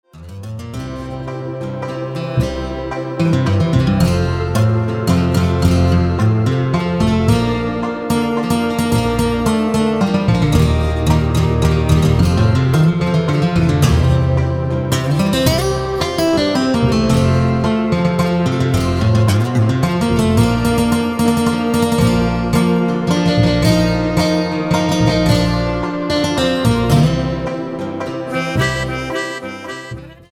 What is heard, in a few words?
Waltz; Second Movement